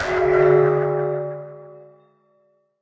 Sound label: guardian curse; minecraft; mob